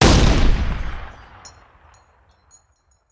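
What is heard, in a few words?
Artillery Cannon Anti Aircraft; Sound Ideas; Guns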